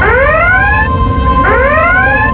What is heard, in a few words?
Red Alert; MACTREK 1.5.1